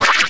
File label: roar; The Elder Scrolls III - Morrowind